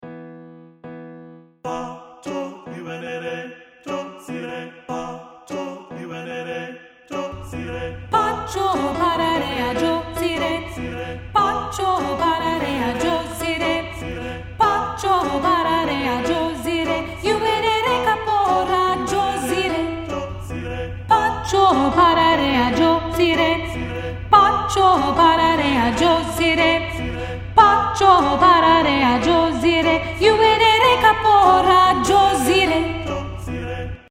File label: Soprano & Alto